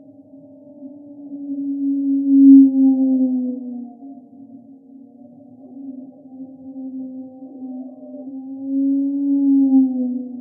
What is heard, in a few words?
heli